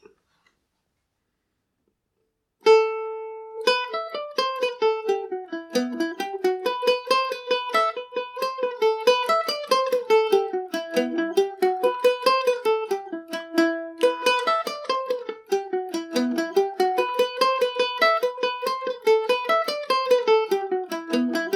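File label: E minor, Irish mandolin; white petticoat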